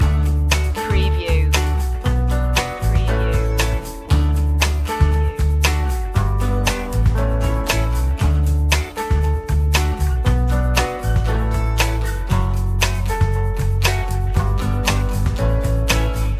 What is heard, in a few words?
easy going loop, corporate motivational